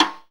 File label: STICK RING; Zero-G; Funky Elements